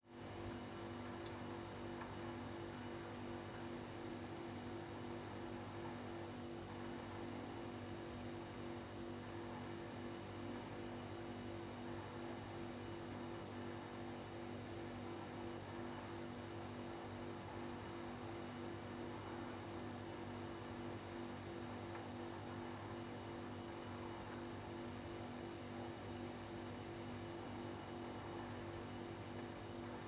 Kitchen Ambience At Night

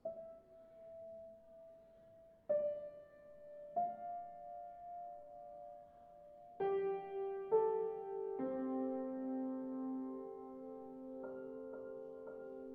Piano Improvisation

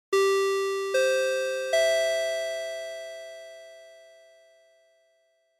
Chime

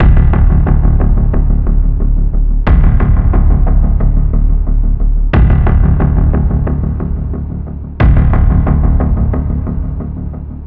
Loop Spy 90

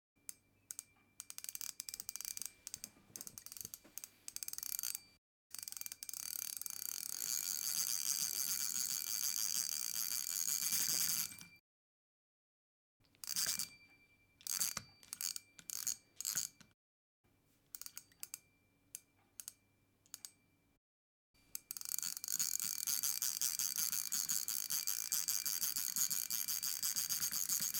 Fishing Rod

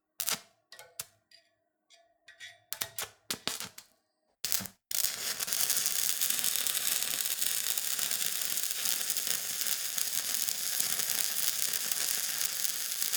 Welding

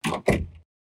Door closing

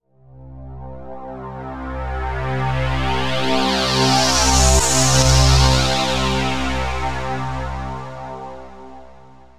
Dark Sweeps

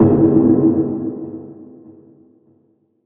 Ambient Hits Ambient Hit

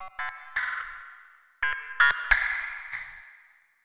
Droid Talking Cheap

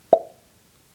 Finger mouth plop

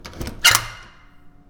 Door

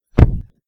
Thump

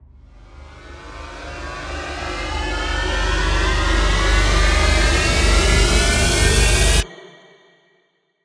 Riser Sorrow

